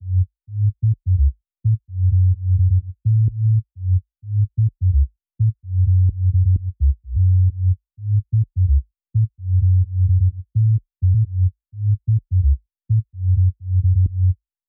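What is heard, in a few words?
128BPM Bass Sub SC, F# minor; Demo Constr Kits; Let's Work It Kit; Bassline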